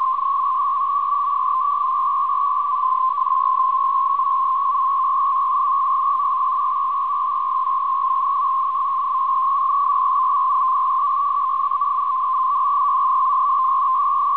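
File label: Israeli intelligence VFT system 150 traffic; isolated 150 Bd channel in idle condition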